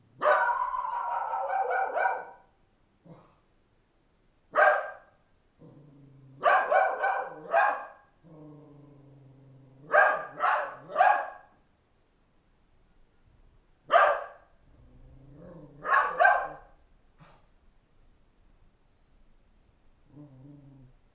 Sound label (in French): Amb Village Nuit Chien; french